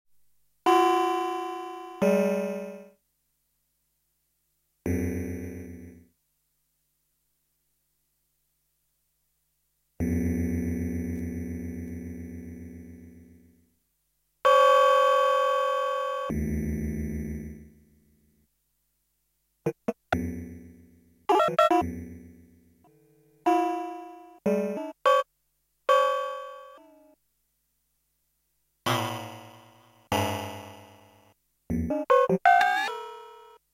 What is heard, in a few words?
Moog solo